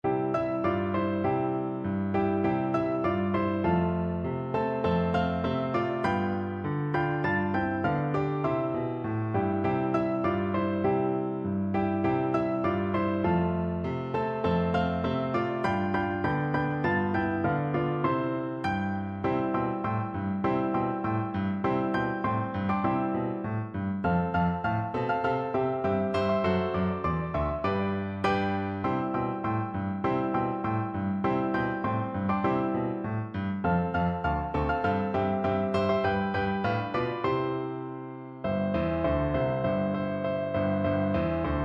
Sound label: piano, piano duet